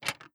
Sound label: lever; Five Nights at Freddy's; FNAF 3